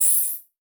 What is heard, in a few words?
Multimedia Internet CD-Rom Flash Short